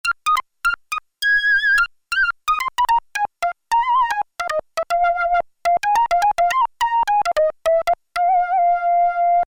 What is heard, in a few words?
Steel Tongue Flute, Korg Poly-61M